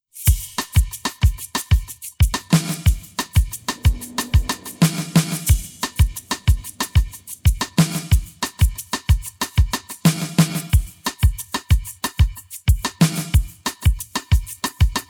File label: drums pattern